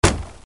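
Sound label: smashing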